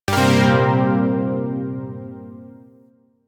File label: Shawzin Blues Chord K; Music; Warframe; Zariman Shawzin